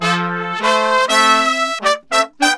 BRASS SAMBA; World Grooves; Best Service ProSamples vol.14; AKAI

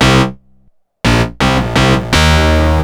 Bass, Synth Bass Loops

